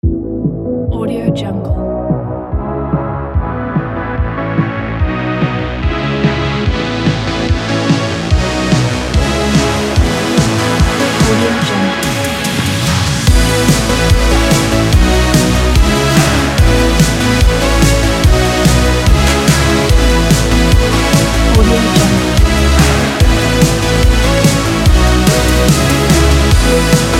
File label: energetic synthwave